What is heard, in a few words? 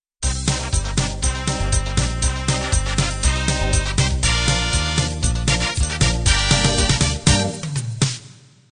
2beat 60-70s intro